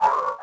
gonk droid vocalization

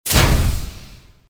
hitech open, door; movers; Soldier Of Fortune II Double Helix; XBOX; doors

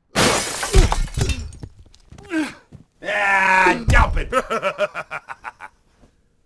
duel outro amb lose; Sid Meiers Pirates; XBOX

